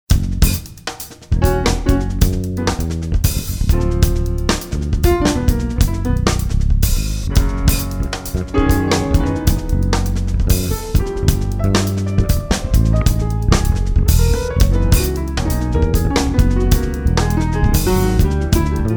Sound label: New Parallel 5 string Solo; Parallels